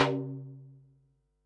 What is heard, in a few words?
tom, Pearl SC-40 Toms